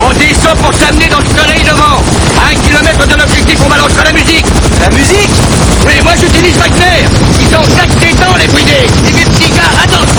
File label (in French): musique